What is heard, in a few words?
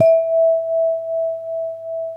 VIBRAPHONE; ILIO